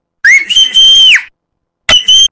chiflido

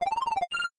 nivel completado; mario bros

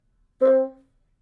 fagot

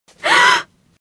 susto mujer

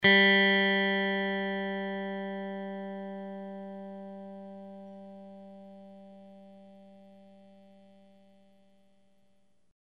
guitarra afinar cuerda tres

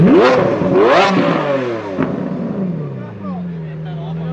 lamborghini veneno coche deportivo

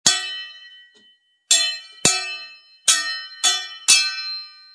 espadas lucha

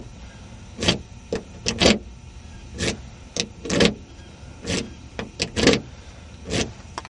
coche

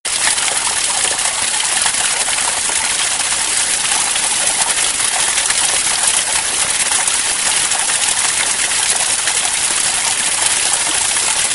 aves

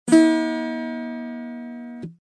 guitarra

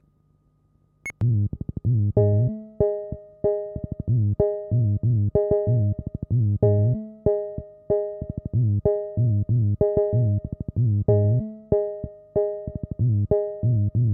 tambor

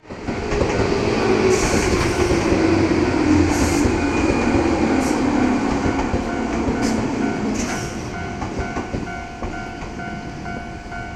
metro detiene

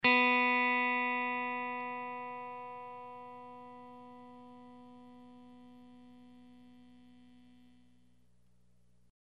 guitarra afinar cuerda dos

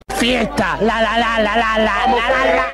fiesta lalala

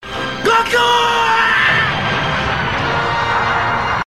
grito; krillin

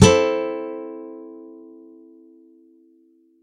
acusticos

